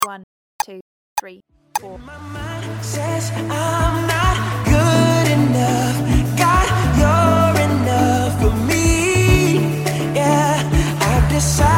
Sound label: echo drum